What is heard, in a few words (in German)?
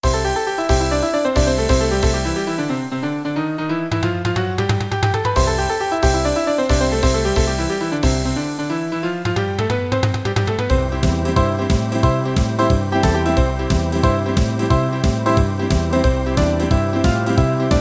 kinderlieder playback